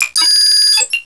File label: display; armada2